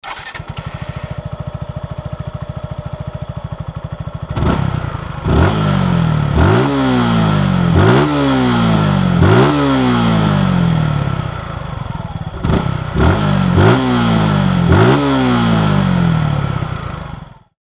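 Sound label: gixxer normal, motorcycle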